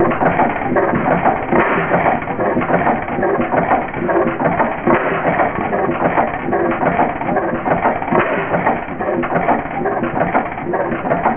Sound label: engine; reid